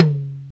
Tom, Yamaha TG-33; Drum Samples

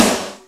Snaredrum, Roland D-70; Drum Samples

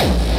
Hard Kick

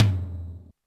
Yamaha RM 50 Toms

